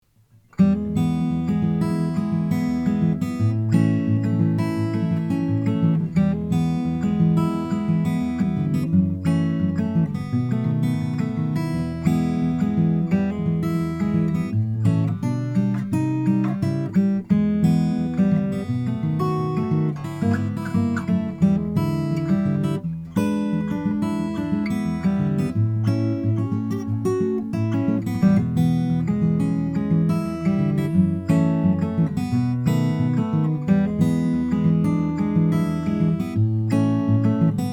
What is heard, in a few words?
olson sj cutaway indian rosewood